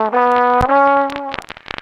Trombone Effect, effected trombone

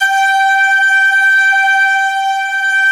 ST-ORG, STRINGORGAN, Yamaha VP-1

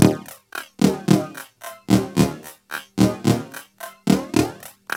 LOOP, TECHNO; TECHNO-BOX; ELEC-LOOPS

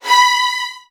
B3, VN 16TH, Virtuoso Strings; ILIO; Kirk Hunter

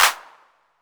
808 CLAPS, Techno Trance; E-MU Formula 4000 Series Vol. 2; OrbitPercMaster

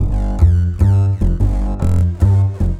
Standup Bass, Drum N Bass

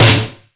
All Snares, E-MU Pro-Cussion; drum machine

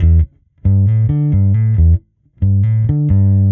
EBass Neck Fing 136 C1; musicradar; bass boutique

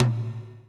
REV TOM3; Greytsounds; Scott Peers Sound Engineering

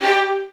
VN FX, Virtuoso Strings

